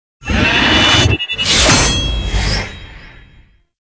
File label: Mounted Shockwave Ability Charge Up; Warframe; Duviri; Dax Mounted; Abilities